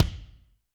Kik Amb 22 Pwr, Drum Machines Alesis D4

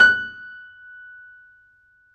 FF F#5 off, Grand Piano off mic; AKAI S6000 CD-ROM - Volume 2

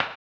HAMBONE, Vocal Blues Soul; Spectrasonics; Planet

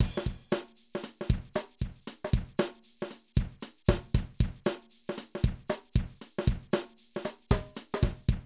Drum loops, 70s funk; Zero-G Groove Construction (1993)

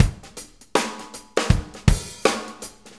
Shuffle Loop, Full Kit Loops; Drum Tools; Shuffles; Sonic Foundry (Sony Creative Software); Loops & Samples Drum Tools

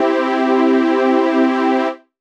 Classic Pad Chord; Big Fish Audio; OSH Chords

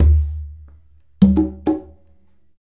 Congas; Drums; Essential Percussion

